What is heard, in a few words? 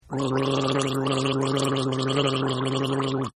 Gargle; Gargling; Sound Effects:Voice